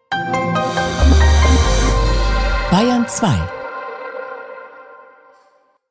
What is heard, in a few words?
Promo Bumper